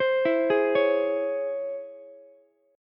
Db Minor Major 7th Ukulele, DbmM7 chord; DbmM7 arpeggiated; JGuitar

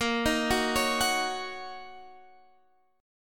D sharp Minor 7th

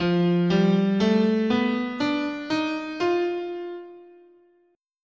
F Prometheus, scale

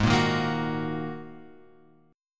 G sharp 7th Suspended 2nd; G#7sus2 Chord {4 1 1 3 X 2} strummed

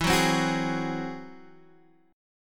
G sharp Augmented 7th E

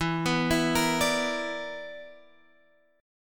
B Minor Major 7th Double Flat 5th, chord

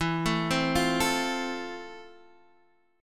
F Major 7th E

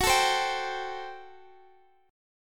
G Major 7th Suspended 2nd Suspended 4th Gb

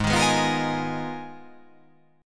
G sharp Minor 6th Add 9th

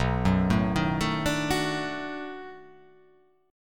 B 7th Sharp 9th, down

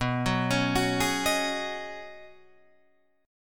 B 6th Add 9th B down Guitar Drop D

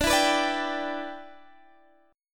E Minor 7th D

